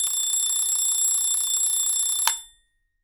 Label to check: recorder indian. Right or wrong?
Wrong